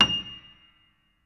Note High, Piano; Piano Miscellaneous

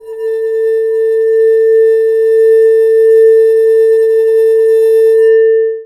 Bowed, Large Wine Glass

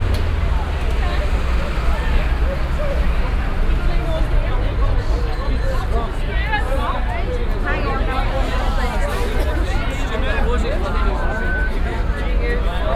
Belfast At Night; Sound Scapes

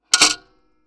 PayPhone

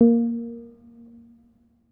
Harm Mid Soft, Piano Harmonics

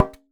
Polystyrene Knock

